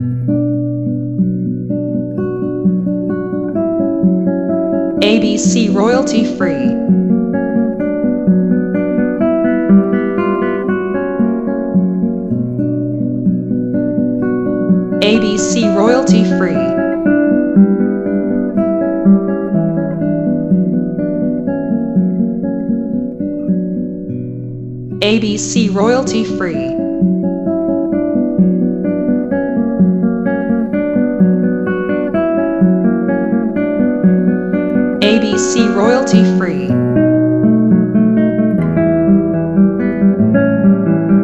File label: B Minor etude